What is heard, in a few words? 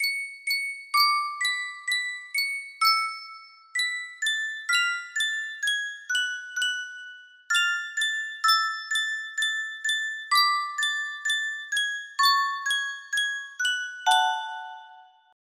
music box, music box melody; sankyo